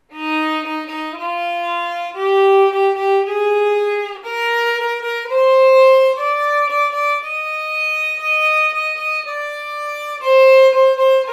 mi b ritmos; mi primer libro de escalas

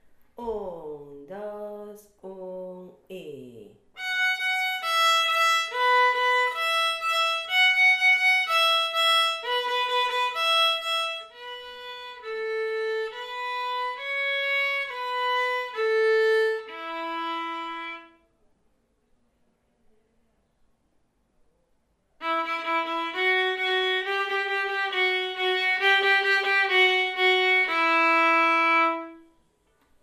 Danza china

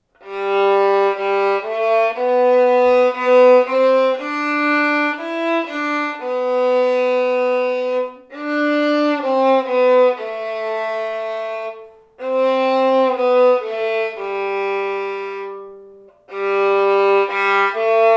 sol cancion, violin; mi primer libro de escalas

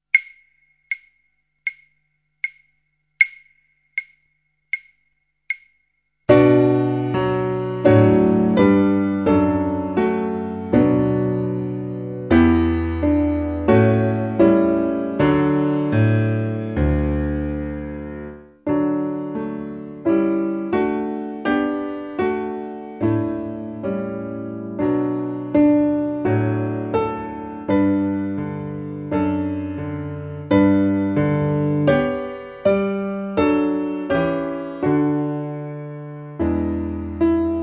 Colinas y valles piano

